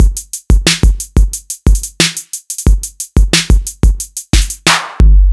Electro Beat

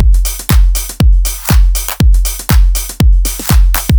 Deep Dance

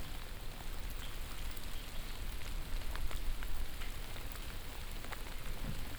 Summerrain, ambient

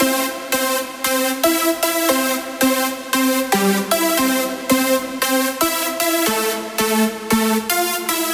Trancy Punch

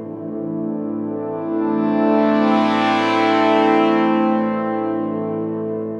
Brass Ens cresca; Сэмплы для фильмов; Brass Ensemble Crescendo; film music; 80BPM; movie score

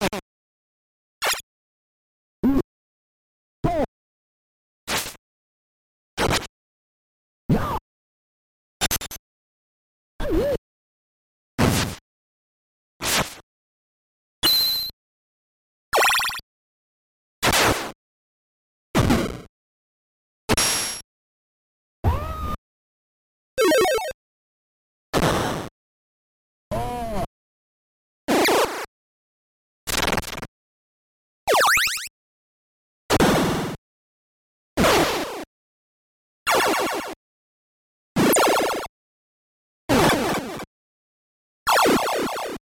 sound effects; kage

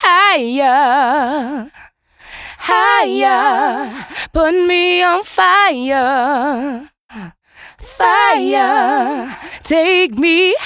Higher Dry, vocal

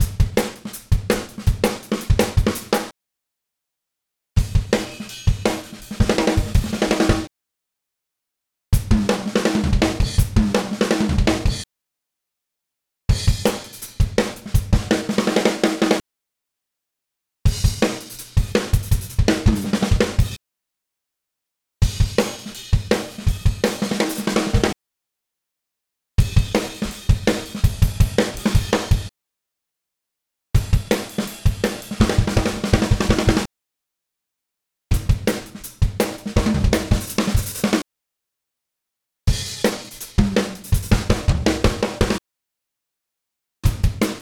DrumNBass Drum Fills 82 5 BPM; Ударные (Drum Loops)

